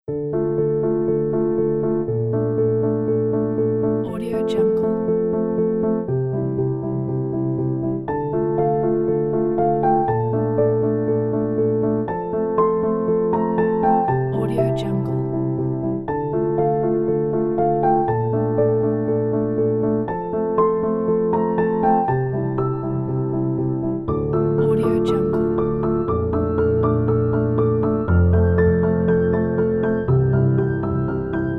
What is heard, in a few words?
running sad piano